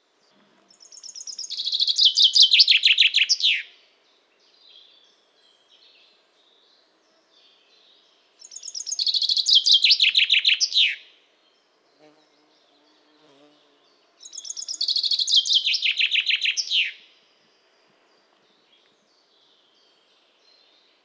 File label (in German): Buchfink Gesang